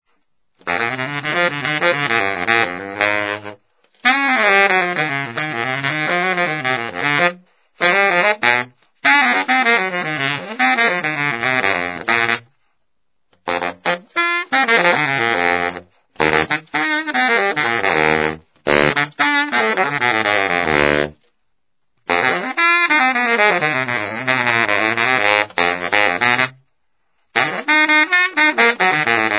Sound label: noodle, baritone; gold medal ii; lacquer